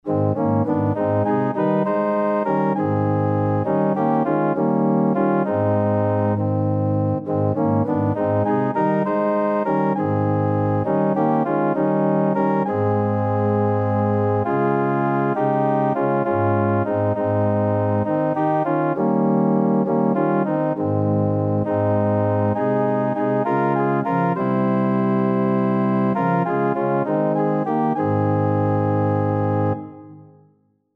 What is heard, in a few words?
organ